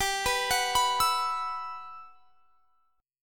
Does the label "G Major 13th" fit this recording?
Yes